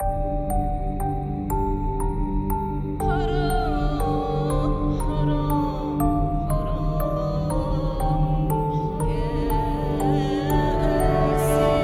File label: Action Mutation 120bpm Mid